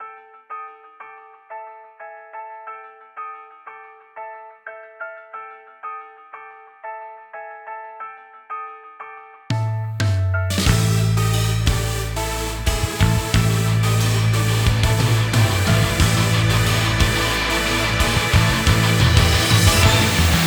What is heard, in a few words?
a dramatic escape; tukito; event